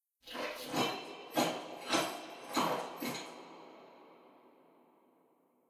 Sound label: walk workout out phase